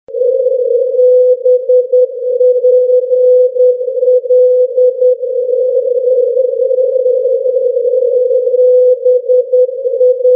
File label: 318.0 khz; blackpool england; europe